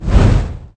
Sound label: demon fireball hit; veznan